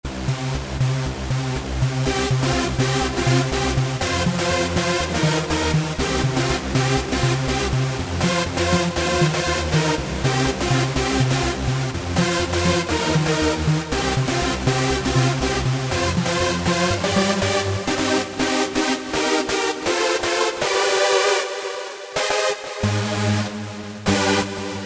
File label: Fat Eurolead